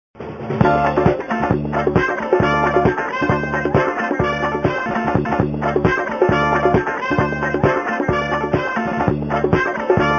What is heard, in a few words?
disco groove ringtone